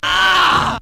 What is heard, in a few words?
"ah" pain, Voice; ooh ahh